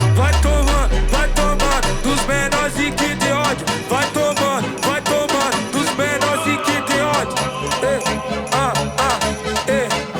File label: funk